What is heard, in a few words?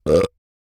burp; OPENAIR; Anechoic